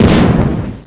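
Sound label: explosion medium; X Wing Tie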